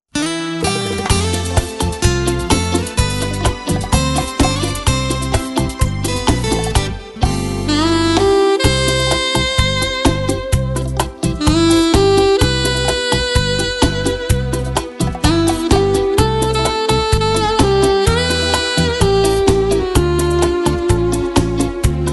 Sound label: Bachata